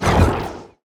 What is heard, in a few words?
spiders enemy spider sister range